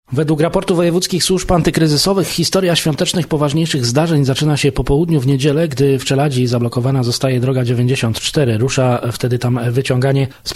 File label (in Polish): rap